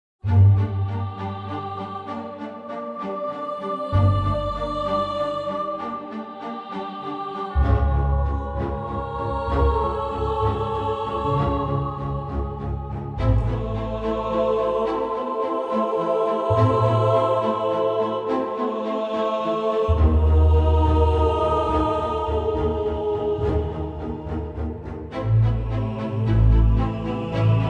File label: Vienna Choir